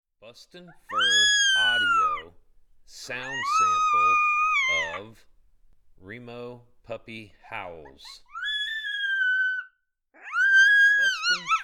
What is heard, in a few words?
Puppy Howls; Remo